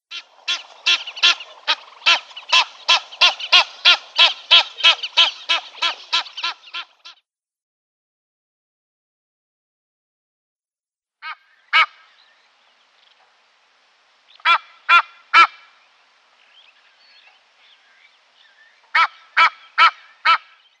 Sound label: Egyptian Goose females